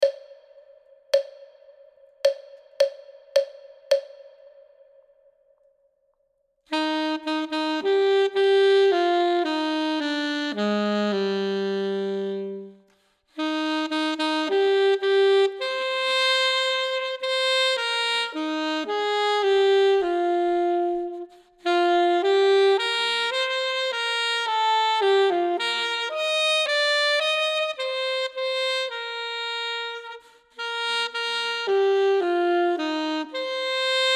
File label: duet part 1; Ten Easy Duets